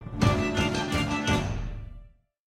Chest Title Unlocked; Sea of Thieves; Arena; Vanity

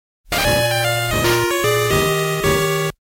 Lose; DKL2

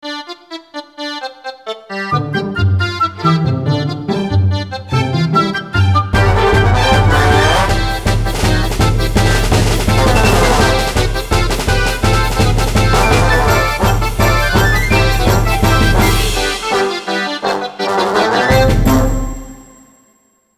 Romance Dance; Lickatoad

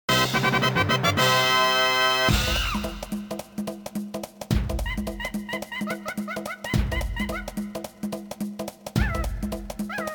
Win Race; Donkey Kong 64

